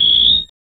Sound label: synthwave crescendo